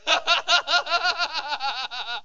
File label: laugh; Virtual Pool Tournament Edition